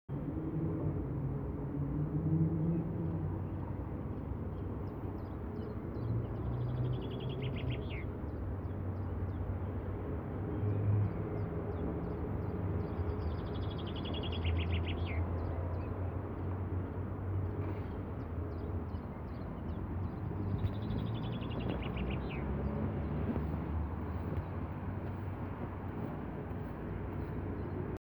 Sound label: Nauener Platz